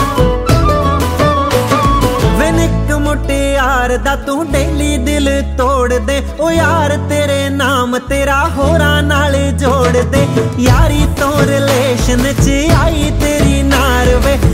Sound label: punjabi ringtone